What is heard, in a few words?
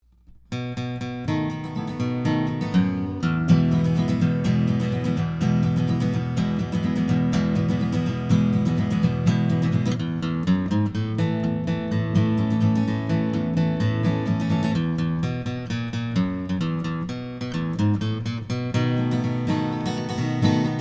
Guitar